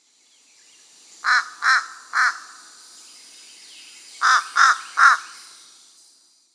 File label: call